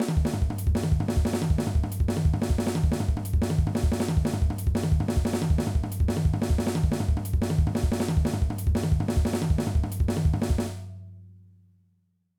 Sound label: Chop